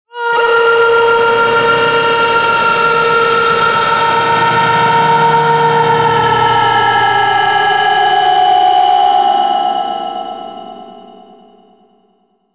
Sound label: scream